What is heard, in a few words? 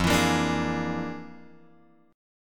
F Minor Major 13th, F; {1 X 2 3 3 4} strummed